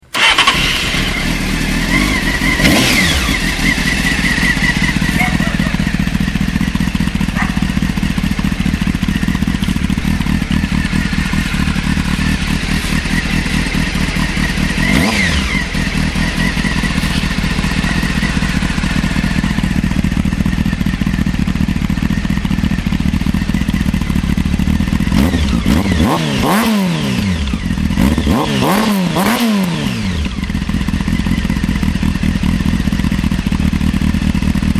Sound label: barracuda honda hornet600 pc36; exhaust